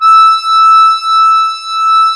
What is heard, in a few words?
DIATO2 E4, Historical Instruments; USB Soundscan vol.23